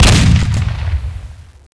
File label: barrel; weapons; svencoop; cracklife